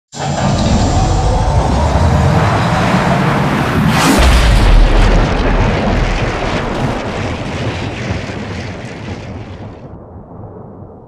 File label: generator startup close; coop; Titanfall 2